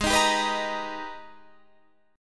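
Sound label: Ab Minor Major 9th chord, Guitar, Half Step Down tuning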